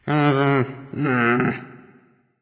grumbling; erlangshen

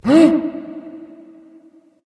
nv breath; Smite; awilix

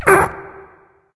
nv grunt lift; cernunnos

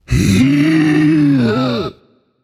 breath; smite; zeus

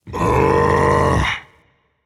sigh rage; chaac

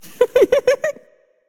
laugh; Smite; ymir v2 skin19

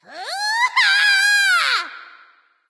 battlecry; bastet

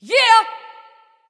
nv yay; smite; awilix

